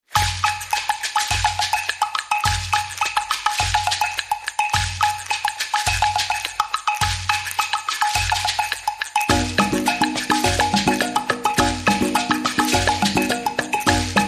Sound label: No Bass